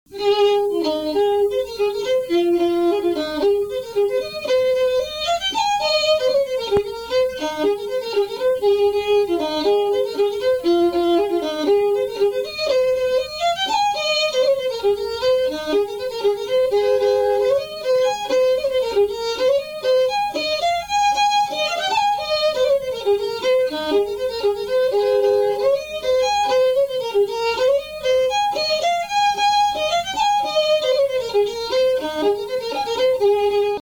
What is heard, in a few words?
farewell to whiskey fiddle; tune tutor